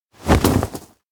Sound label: fall mountain; anemoi パッケージ版